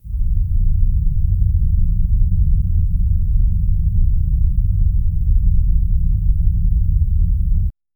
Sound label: soft low pitched hum